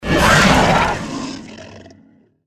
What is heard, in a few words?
gecko combat idle; npc